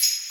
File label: EARTH TAM; E-MU Producer Series Vol. 6 – Analogia Project; J.L.'s 109 Traps